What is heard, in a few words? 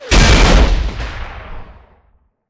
rocket explosion close; zoochosis helicopter